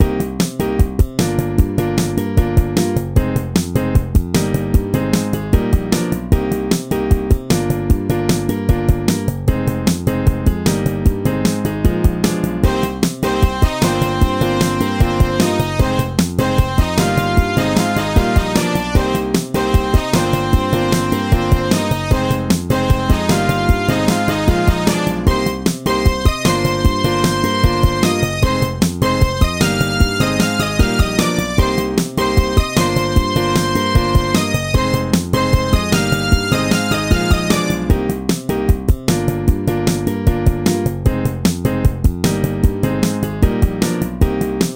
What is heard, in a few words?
Disco Mix; Nitemare 3D